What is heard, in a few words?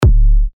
Kick D; techno; Yeti